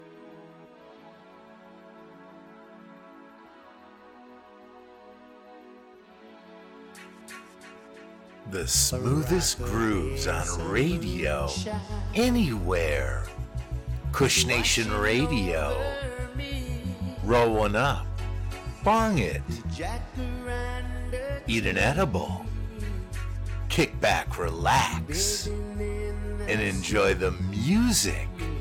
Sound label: Smooth Grooves; Kush